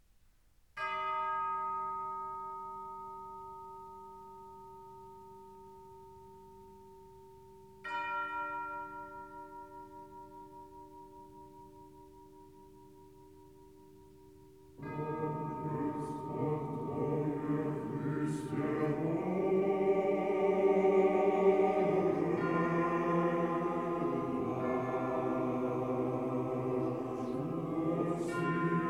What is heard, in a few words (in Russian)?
tropar rozhdestvu hristovu glas 4 obihod, hor donskih kazakov; spasi gospodi lyudi tvoya